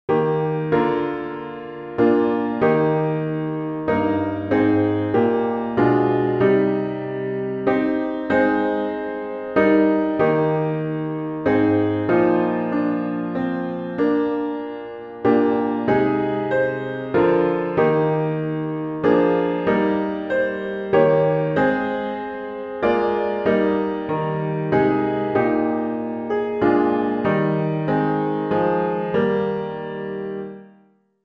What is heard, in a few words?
piano all parts; psalm71